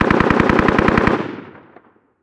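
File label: gun m240 distant; Call of Duty Modern Warfare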